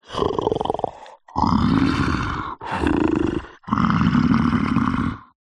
horror yeti monster growl snarl; zapsplat

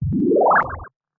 synth space weird synthy sci fi power up short

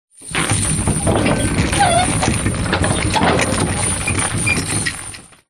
medieval wood heavy mechanical manual chains; zapsplat

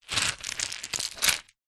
stones handful pick up from tiled floor; foley

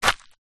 footstep single sandal woods ground stoney path; zapsplat; foley

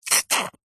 hospital syringe squirt foamy liquid

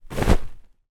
household pillow movement childs

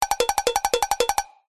cartoon run scramble percussive cowbell

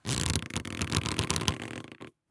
Leather Metal Creak; Creaks n Squeaks; soundbits

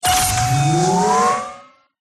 Spawns Portals Teleports; PMSFX

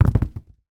sport tennis balls x3 tip on floor; sound effects one

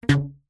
foley rubber band ping med pitch

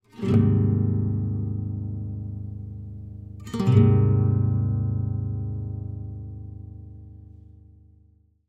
guitar nylon string strum strings discordant

